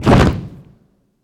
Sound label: Soldiers